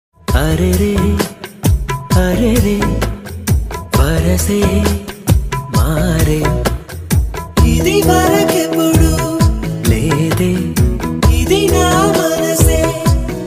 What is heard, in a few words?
love bgm ringtone, telugu best ringtones; happy days